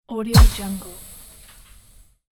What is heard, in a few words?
puff